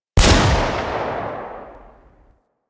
Revolver Rifle Shot; Warframe; Tenno

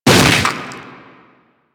Proto Infested Runner Arm Attack Hit; Warframe; Abilities

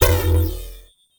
sphere small power on; ambience; Warframe; Entrati

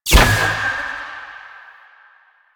Choir Bow Fire; Warframe; Weapons

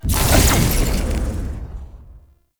Portal Spawn; Missions; Zariman; Zariman Exterminate

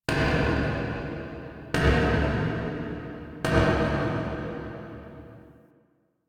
Misc Knocks Small; Warframe; Ambience; Entrati

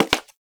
Human Loid Foley Step; Warframe

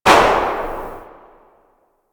Magnum Tail 2D, Weapons; Warframe; Tenno

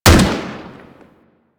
Rifle Shot, Weapons; Drake

